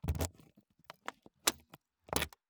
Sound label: scooter trunk keylock; soundbits-soundfx